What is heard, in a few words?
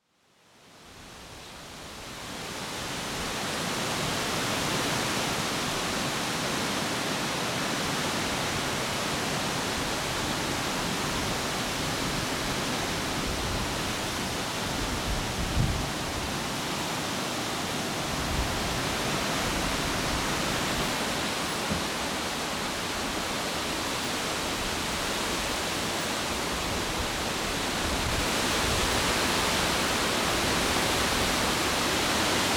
trees in the wind atmo